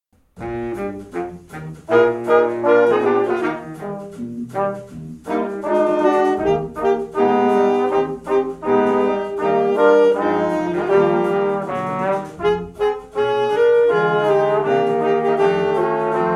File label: jingle bells